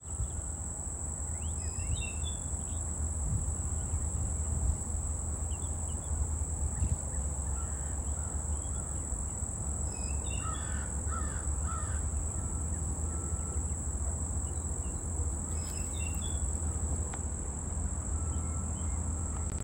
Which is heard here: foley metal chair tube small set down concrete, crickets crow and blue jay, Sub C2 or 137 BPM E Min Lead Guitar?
crickets crow and blue jay